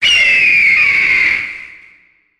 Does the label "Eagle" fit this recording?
Yes